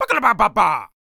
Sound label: Knight Attack Vocals